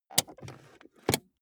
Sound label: car bmw e46 interior handbrake release